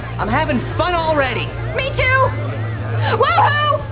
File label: awoohoo; buffy